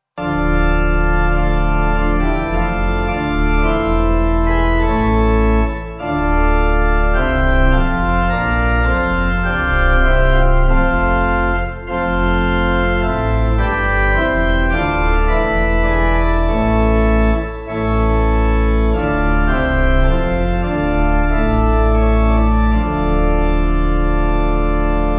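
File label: Pipe organ, church music backing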